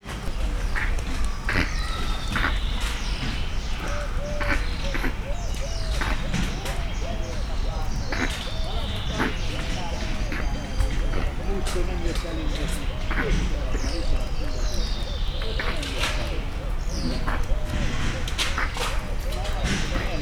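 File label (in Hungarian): halat esznek az keresztben álló szájukra, rózsás gödény; szegedi zoo 2011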